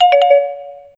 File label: ding; minigolf